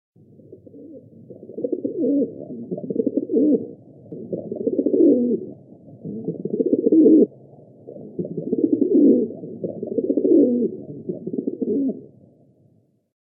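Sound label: sizyy golub voice, rock dove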